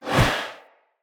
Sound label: Husk Whoosh Heavy, Unarmed; Weapons; Enemy; Mockery Husk